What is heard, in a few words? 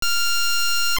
Magnetic Gloves One Second; Magnetic Gloves (sample sounds, about half a second and one second long); Zelda Oracle